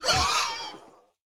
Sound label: Death